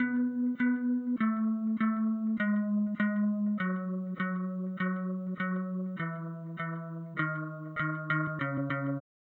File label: bass